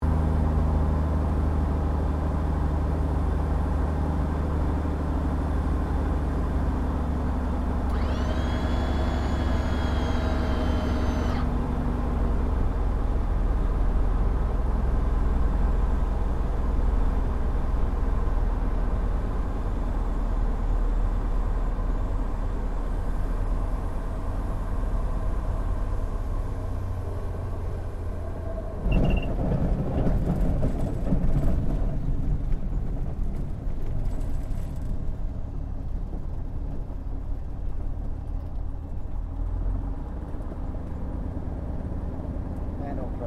AIRCRAFT CESSNA O-1 BIRDDOG LANDING INTERIOR, spotter aircraft; Airplanes; Vehicles